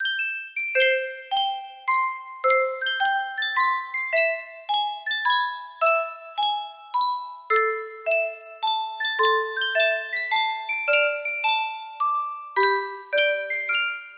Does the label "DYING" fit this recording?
No